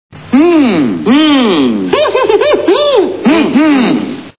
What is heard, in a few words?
hmmm; three stooges